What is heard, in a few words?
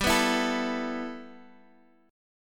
G 6th Add 9th, G, Guitar, Drop D; x,x,5,4,5,5; down